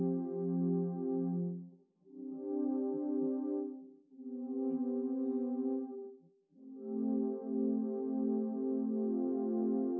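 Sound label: with drums, instantaneous mixture; SiSEC11; underdetermined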